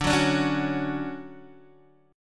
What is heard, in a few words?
D Major 7th, D, down, Guitar DADGAD